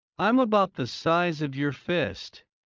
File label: Heart low; Classroom humanbody